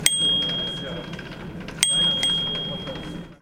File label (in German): Fahrradklingel